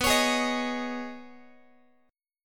B 6th Add 9th B down Guitar 4A 4E 5A 5D 5G sharp 6C sharp; B6add9; strummed